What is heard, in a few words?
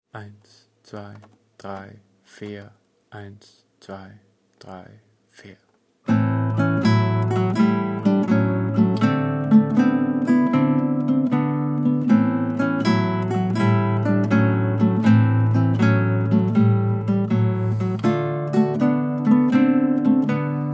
Honky tonk Blues slow